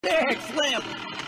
neck flip

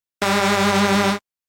klaxon depart

